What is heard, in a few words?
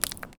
Foley